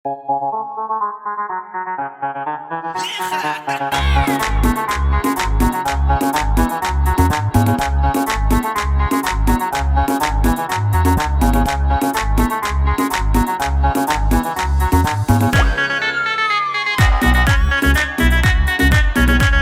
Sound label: beats riddim kurdsh arabic mix